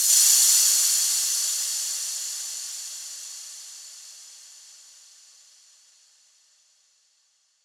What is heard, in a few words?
Hat Crash; Psytrance; FX